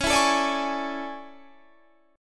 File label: A Major 11th, guitar